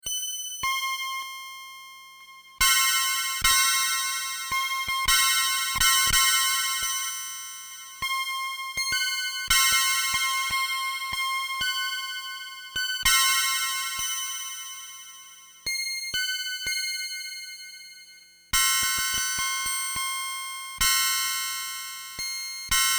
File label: pss470 overclocked; bendexamples